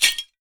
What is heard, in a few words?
AFRICAN, African percussion; Voice Crystal - Steve Reid Definitive Percussion